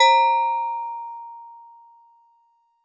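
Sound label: Steel Drums DX100 A#0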